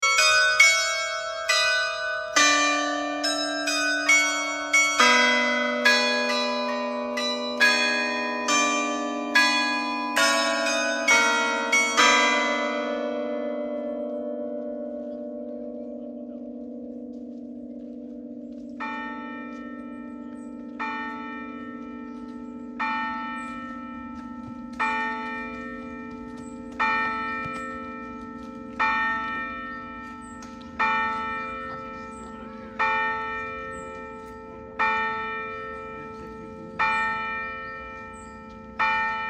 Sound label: Carillon of the Chapelle Notre Dame de Grace